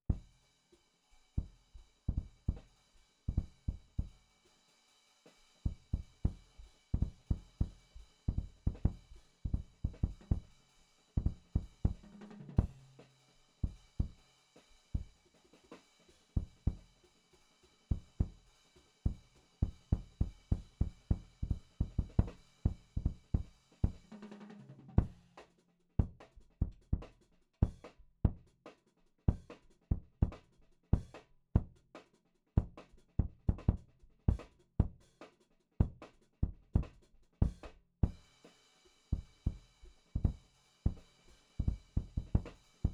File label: KICK PUNCH, drum and bass jazz; JUNGLE BEATS; RAW MULTITRACKS